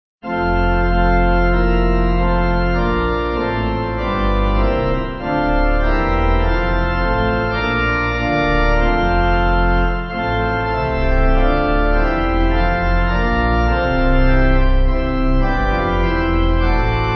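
Pipe, Free Music Backing Files for Churches - Piano, Band, Pipe Organ; Joyful Joyful; Alleluia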